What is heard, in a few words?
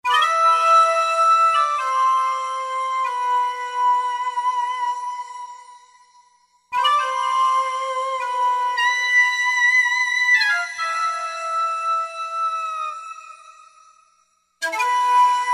Fanta Flute; Factory Preset examples